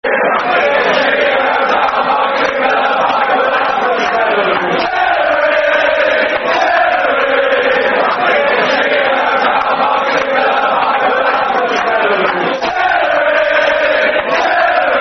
Celery, Football Chants; Soccer Song; Chelsea Cfc

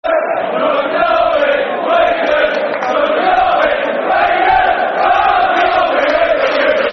We Love You Derby Football Chants; Derby County Dcfc

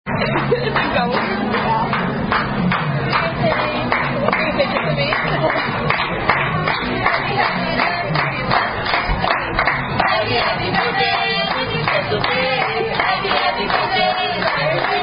Happy Birthday, Red Robin Happy Happy Birthday

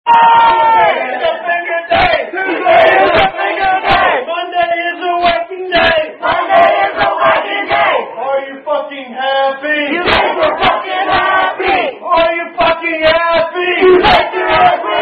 Rugby Song

